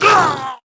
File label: Die; Clover Trumpy; Gamigo